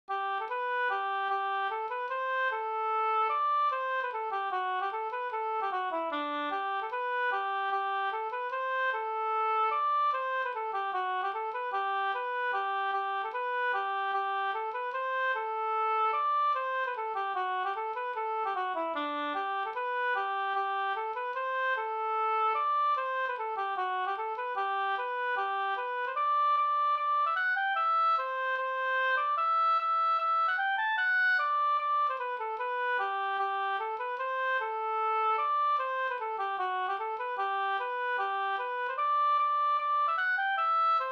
reinlender; fr Eidskogen o Eda Vr

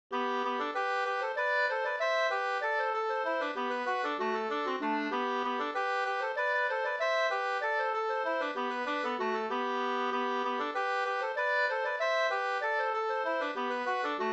engelska f tre par

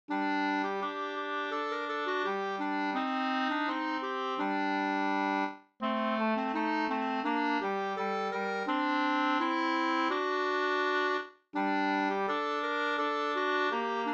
vismelodi

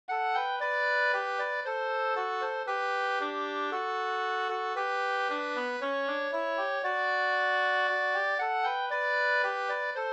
skanklat

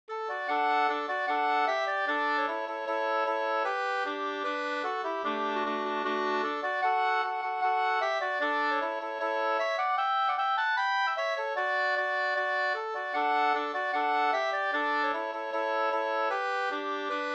schottis; Skuttungeschottis Up 2st

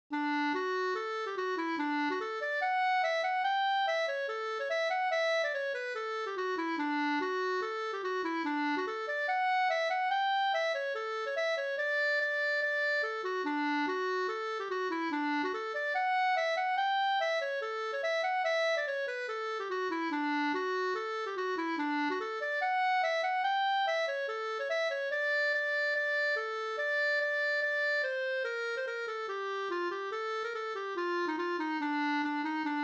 schottis Finsk schottis

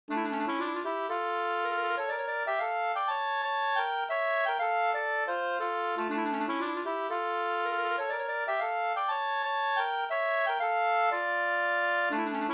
polska storpolska

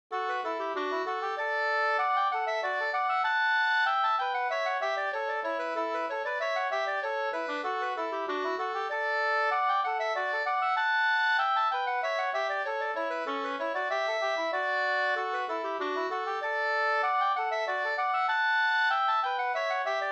slangpolska

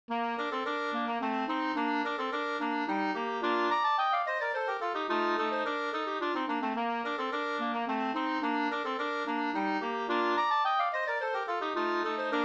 polska polonas Stugun; Orn

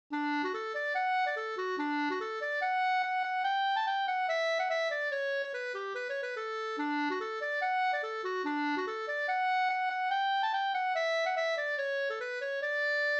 reinlender

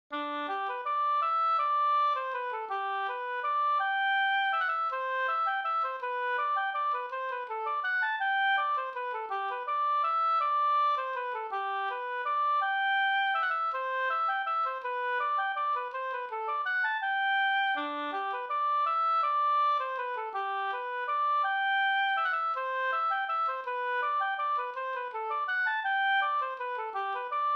vals, Nyckelharpan; Gas-Anders

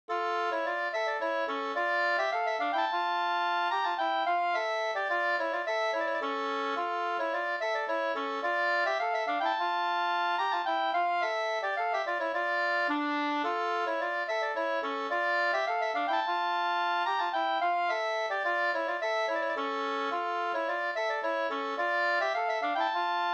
slangpolska Skratttpolska 2st

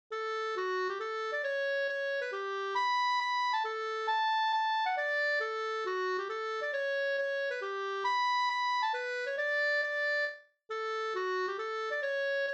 mazurka

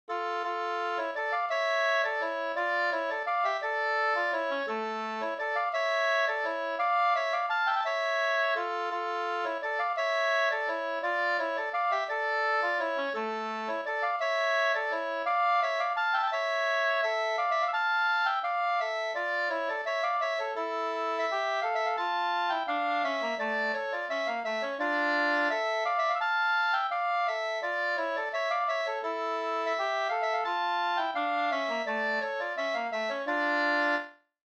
vals Brudvals

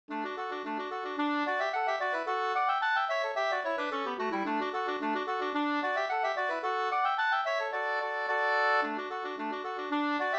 engelska

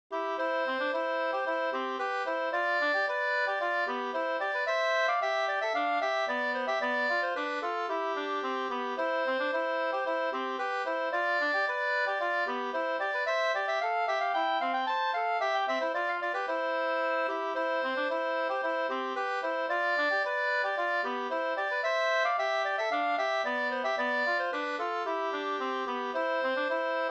ganglat; Mungalaten fr Up

